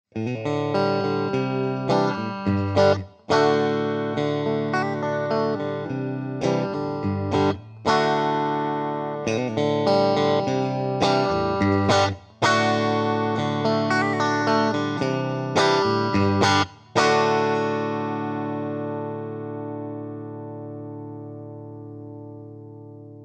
middle and bridge

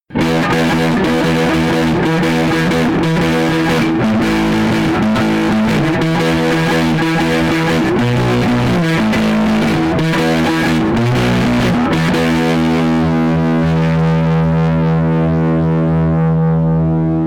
JCM800 Middle Hot

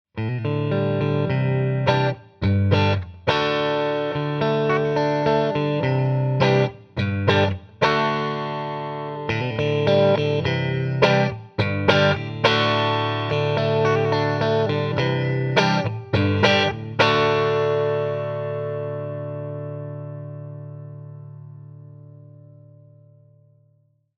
neck and middle

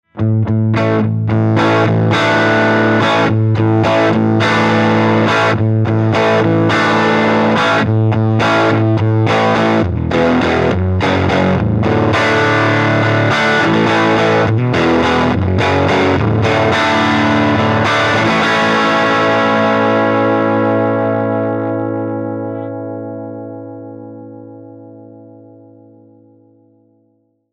PAF bridge overdriven

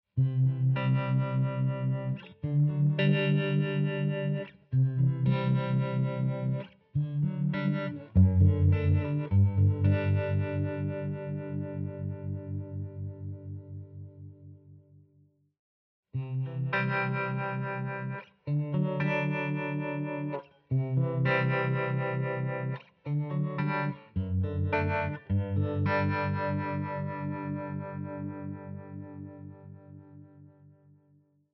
harmonic tremolo neck then bridge

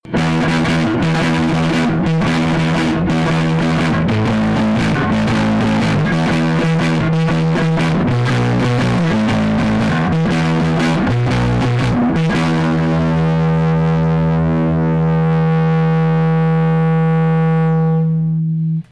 JCM800 Rhythm Hot

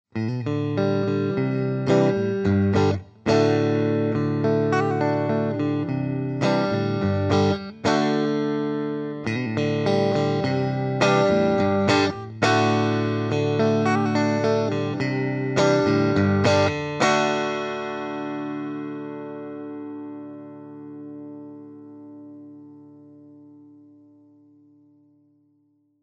6S9H neck and middle

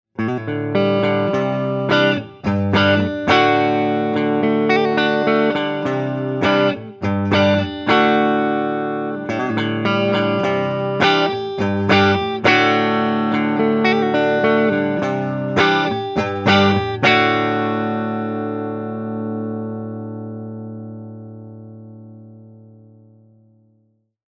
Powerplus neck

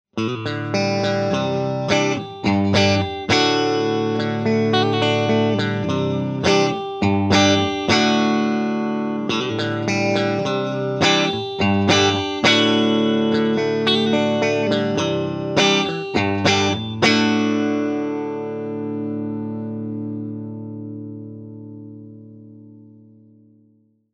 Powerplus neck and bridge single coil

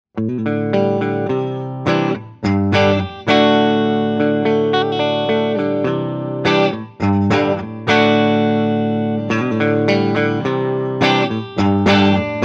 PAF neck and bridge

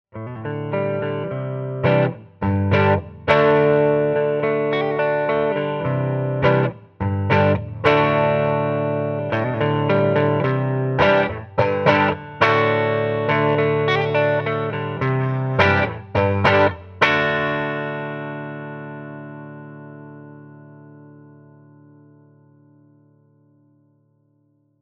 PAF neck

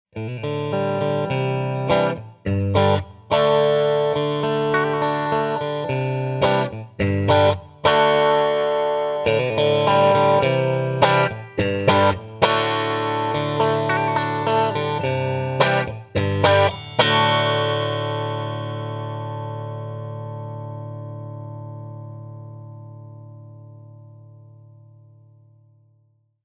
5s4 middle and bridge

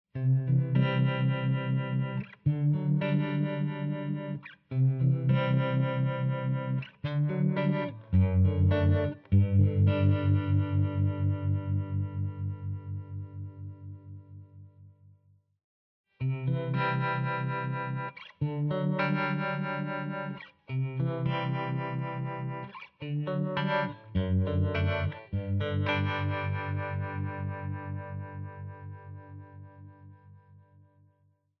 harmonic tremolo neck then bridge

